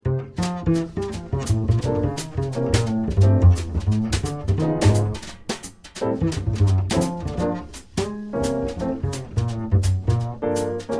minus melody

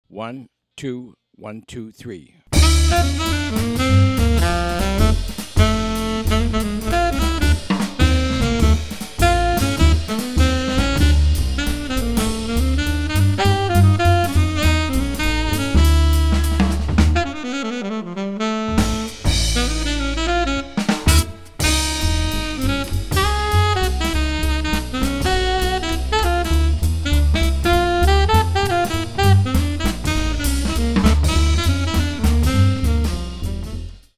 minus piano

